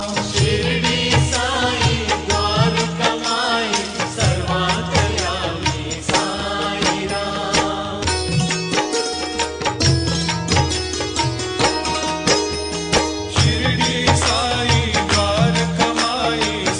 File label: ringtone; shiridi sai